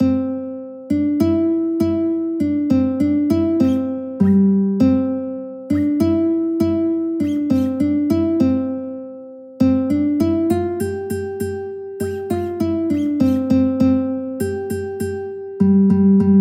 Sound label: alouette guitar